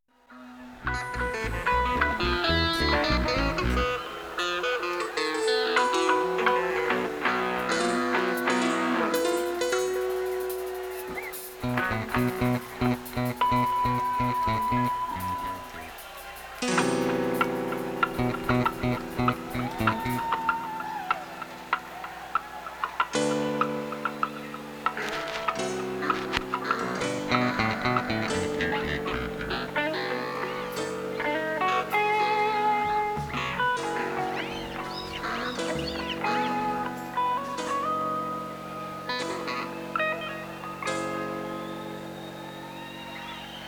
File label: Tuning